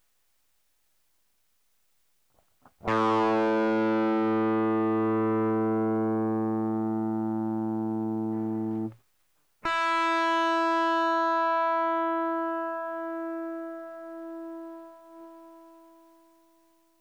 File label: overdrive, Guitarra